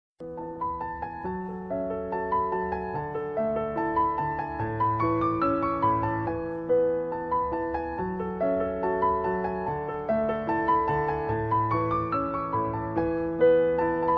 twilight piano

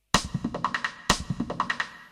brewing storm

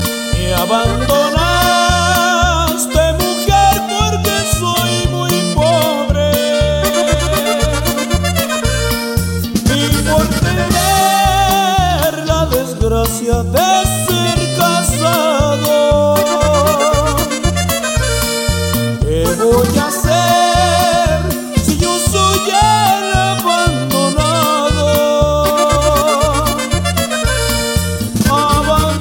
norteno

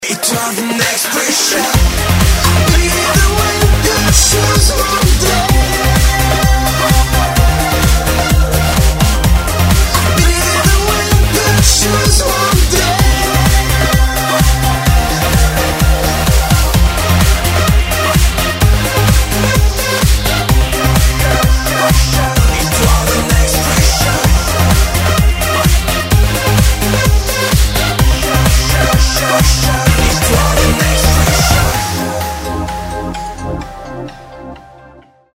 tom snare shout

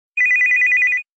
nokia classical

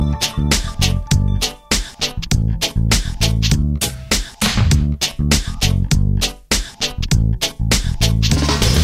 beat with drums

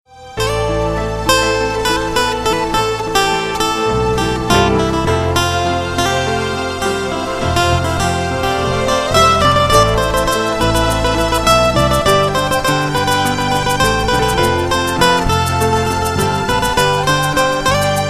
guitar music

